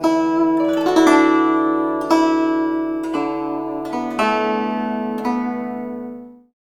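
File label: guzheng; Spectrasonics; Heart of Asia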